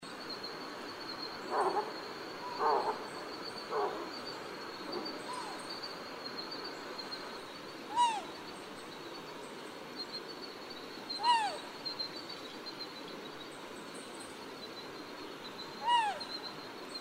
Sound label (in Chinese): 領角鴞; 鴟鴞科/留鳥